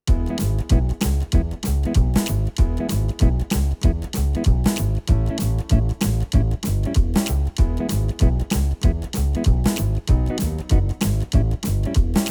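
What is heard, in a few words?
style r and b rock and roll shuffle, Yamaha tyros3; rock and roll shfl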